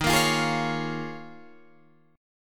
Eb 9th Suspended 4th Eb, Guitar DADGAD; 13,13,15,13,16,15; strummed

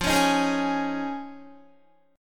F Minor 7th Sharp 5th, Guitar; strummed

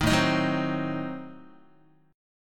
A Diminished 7th A down Guitar; Adim7 strummed; 6,5,6,4,4,x; JGuitar

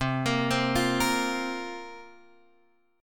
C 7th Suspended 4th, Guitar, Open G

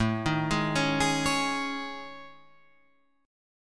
A Major 7th Flat 5th, down Guitar Open F; AM7b5 arpeggiated; JGuitar

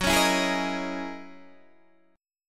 F sharp 13th F sharp down Guitar DADGAD

